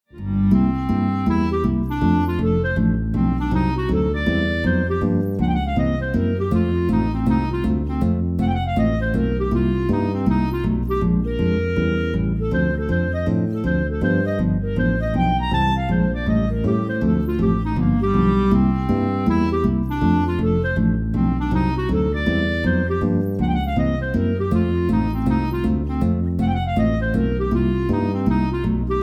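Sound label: BLUES clarinet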